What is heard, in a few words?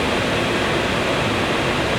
Inharm, Noises; Xfer Records Serum; Serum Presets; FP Inharms